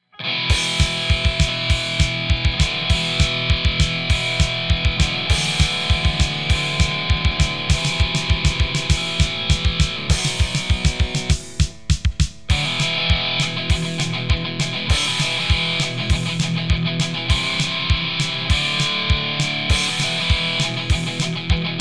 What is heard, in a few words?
testtone, guitar tone